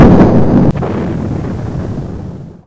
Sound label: boom hit; CANNON